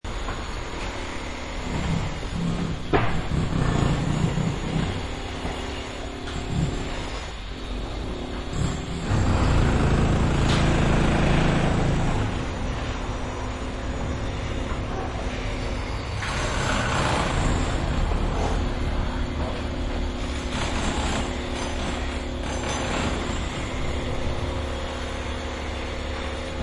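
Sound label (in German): baustellenlärm; verkehrsgerausche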